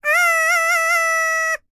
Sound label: MACEDONIAN, World Voices; ILIO; Vocal Planet VOL-5